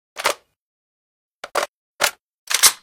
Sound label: svd reload, weapons